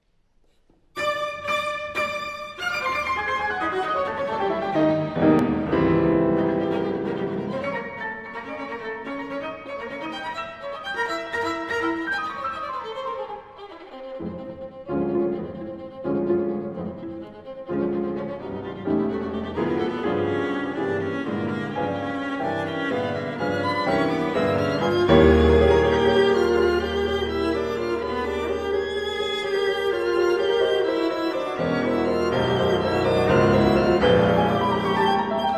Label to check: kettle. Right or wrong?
Wrong